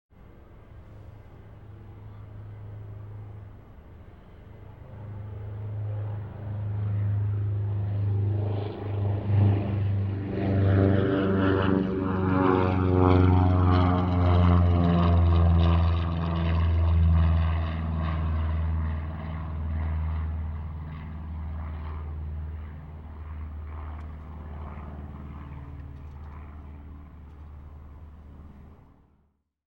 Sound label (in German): startende zweimotorige propellermaschine; geraeusche digital